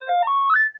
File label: Tricorder Alert; TOS